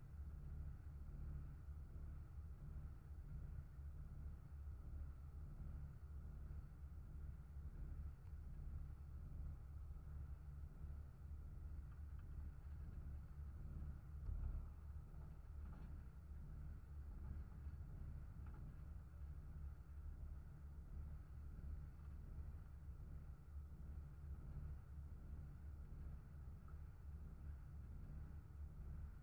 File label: Ferry Vibrations